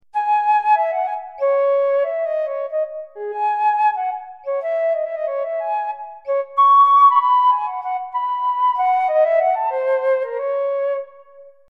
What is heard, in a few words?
Flute Solo; sibelius sounds